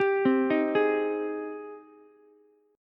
G sharp Major 7th G sharp, Ukulele; 12,12,11,11; JGuitar

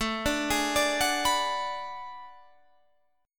B Minor 6th A; Bm6/A arpeggiated

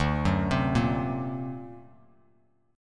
D Augmented 7th Bass Standard, chord; 10,9,10,3; arpeggiated; JGuitar

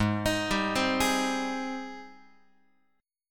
G sharp Major 7th G sharp, Guitar; x,4,6,5,4,7; JGuitar

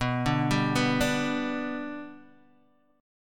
B Minor Sharp 5th, Guitar, Open D

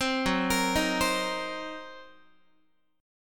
Gb 6th Flat 5th Gb down Guitar

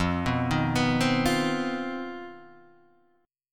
C Minor Major 7th Double Flat 5th F, chord

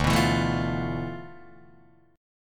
D Minor 6th Add 9th, Guitar, DADGAD

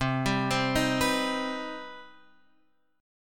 C Minor Major 7th down Guitar Open F; arpeggiated; JGuitar

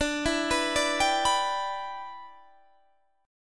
G 6th D

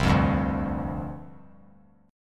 C Suspended 2nd Suspended 4th, down; Csus2sus4 strummed

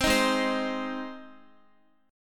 Ab Major Ab down Guitar; Ab strummed

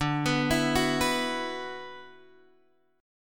D 6th Add 9th, Guitar, Open G

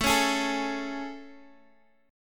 A Major 7th, Guitar Drop D; chord

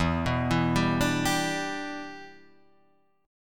E Minor 7th, down; arpeggiated

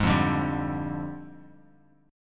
F Minor 6th Add 9th F down Bass Standard; JGuitar

